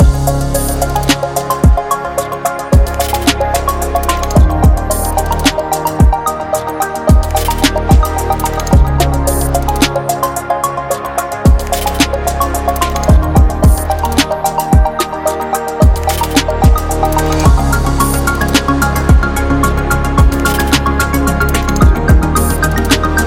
Tech Loop; hi tech

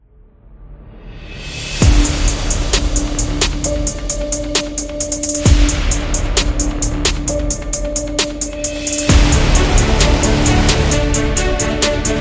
Epic Beat

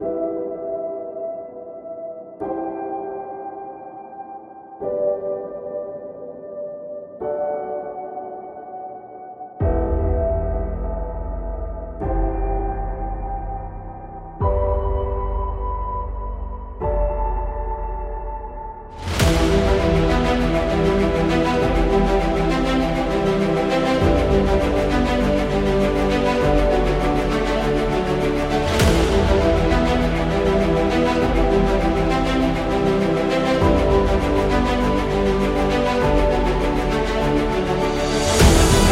Trailer

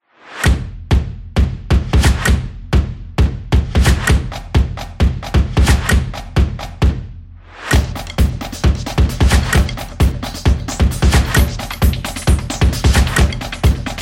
Urban Commercial; fast tempo

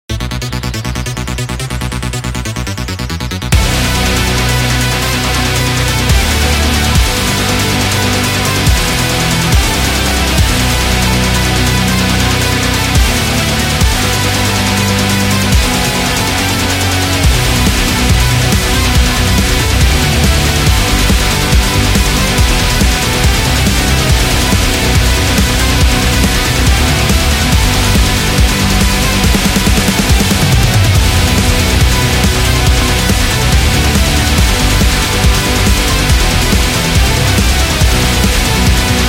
Metal Game

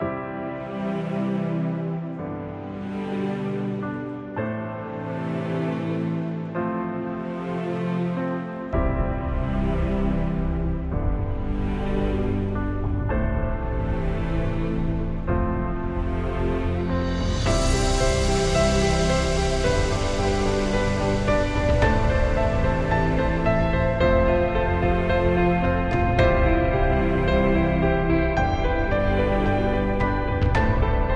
Piano Inspiration; documentary

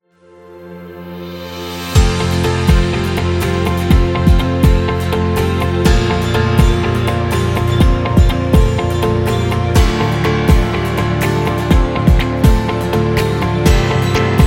Motivational; explainer

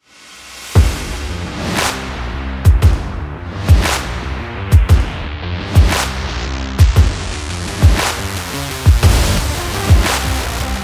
Stadium Trailer Rock

